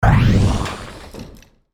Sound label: title effect with blast of distorted digital noise